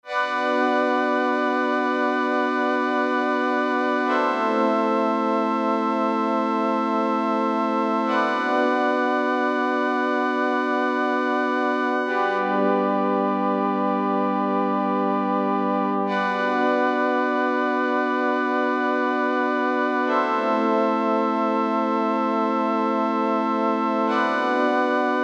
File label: DX7 MF Flange Stereo Image strong enhanced; SSL Fusion